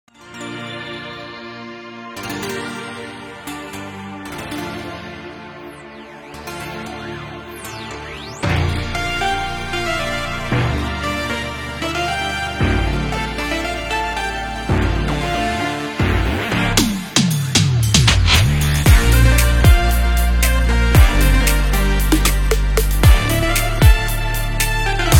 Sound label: Rusty Electroguitar